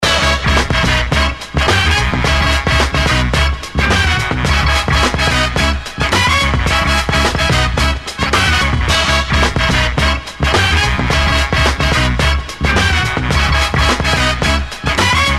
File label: FUNK BOMB